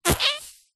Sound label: cartoon fart